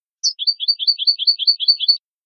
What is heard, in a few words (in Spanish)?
boli